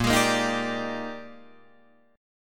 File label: Bb 13th Bb down Guitar Drop D; Bb13 strummed